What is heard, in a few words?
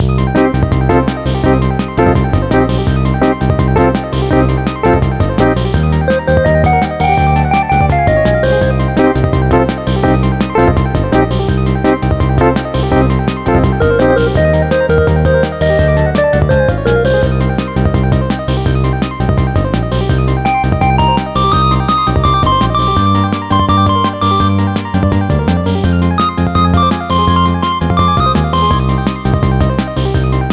Bonus Game Tune; the simpsons hit run; ps2